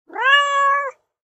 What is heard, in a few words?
meow